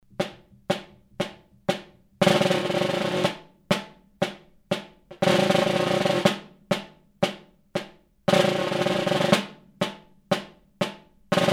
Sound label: snare